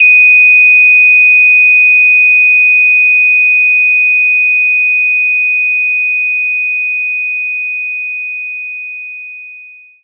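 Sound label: French Horn mf D#6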